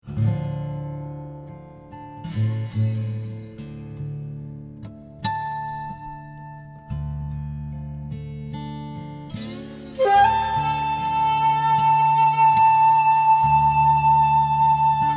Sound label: blues